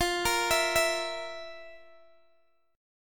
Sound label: F Major 7th Suspended 4th, Guitar, Open D